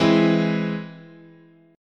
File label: E Augmented, chord